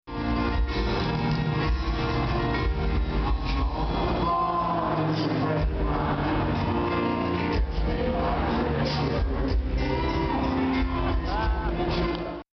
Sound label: piano